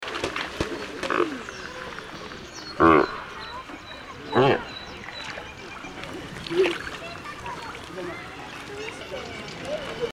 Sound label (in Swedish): Vit pelikan